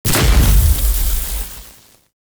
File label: map boom